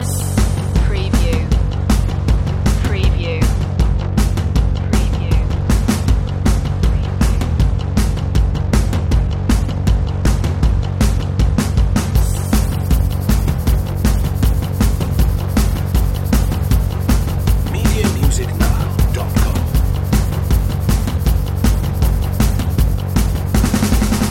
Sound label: dirty fun loop